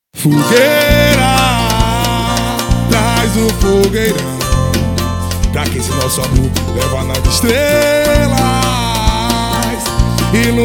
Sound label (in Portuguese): samba